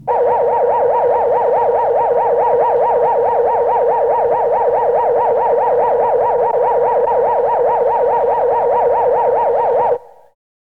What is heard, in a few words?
Spectro-Analysis Viewer, Sound Effects; Star Trek Original T. V. Series Sound Effects